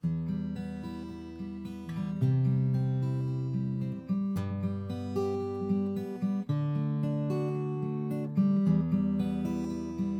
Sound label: Fingerstyle